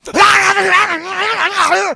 become enraged, infected

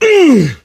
melee swing, player survivor voice; source engine; left 4 dead 2

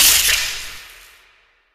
spark, coop electric; player

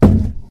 cardboard box impact hard, physics; Source Engine; video game

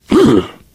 pain relief sigh, survivor voice; source engine; left 4 dead 2

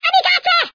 kamikaze; Source Engine

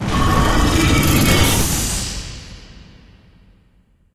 pincer wall reveal up; Source Engine; Portal 2

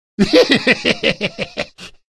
mad laugh; Source Engine; video game; ravenholm

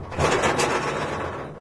door metal thin move

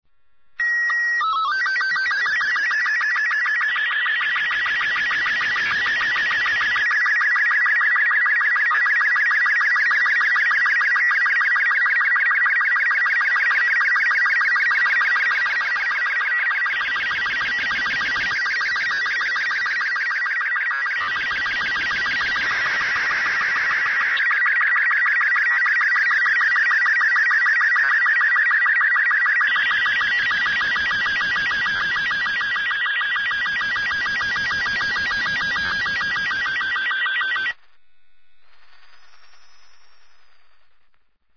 ambient; Portal 2; Source Engine; video games

